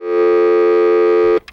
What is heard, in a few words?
dialtone